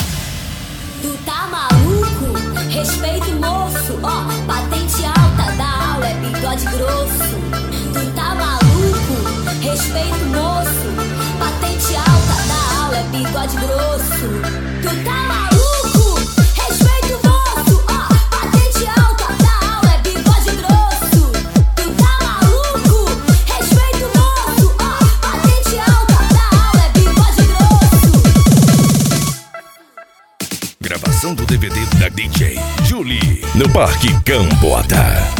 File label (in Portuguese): explosao das maquinas bass